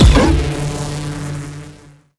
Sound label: Shot Beam Medium Derail Gun